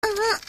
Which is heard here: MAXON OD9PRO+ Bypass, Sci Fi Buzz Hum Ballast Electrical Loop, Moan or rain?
Moan